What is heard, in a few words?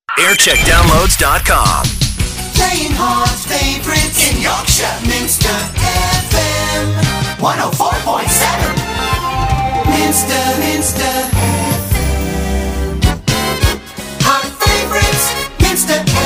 jingle montage; minster